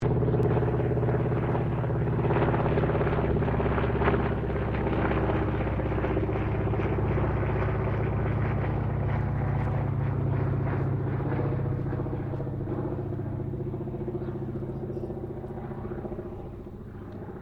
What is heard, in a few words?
AIRCRAFT CAC WIRRAWAY PASS DISTANT STEREO; CA-16 Wirraway; Airplanes; Vehicles